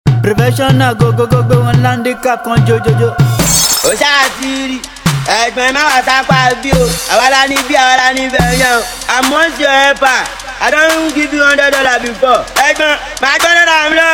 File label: Professional Beat